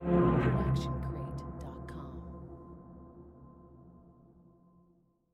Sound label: Eerie Low Bowing Bend; SoundsCrate